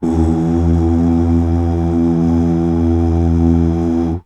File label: UUH